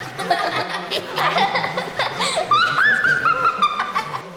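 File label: laugh child multiple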